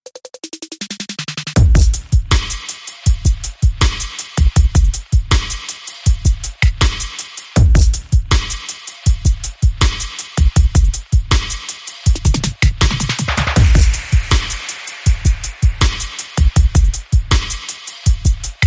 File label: Beat